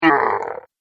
Zombie Hurting; horror; Zomby Sound Effects

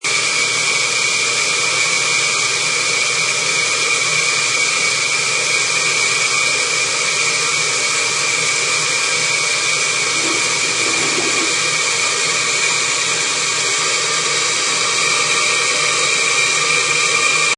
Noises from basement pipes.; Household

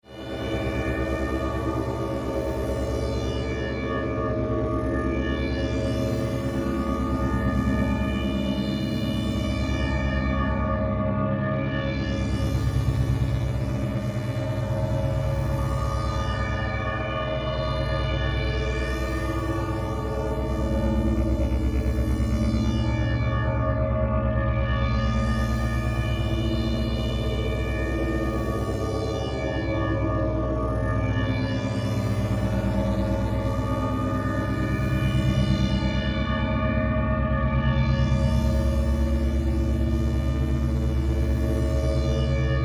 Force Field Drone, Synth, Musical; BlastWave

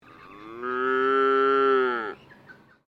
ANIMAL COW FRIESIAN CALF MOO; Farm Cow; Cows; Animals

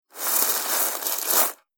Packaging Paper Noises

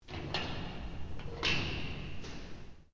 Door Metal Large Open; Various doors

